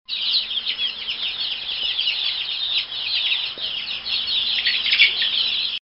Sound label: chicks hatching